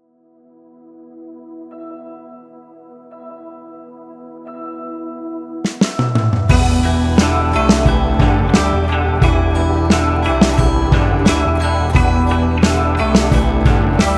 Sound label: alt rock